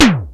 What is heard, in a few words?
Drumulator tom; Crypton - DrumBase5000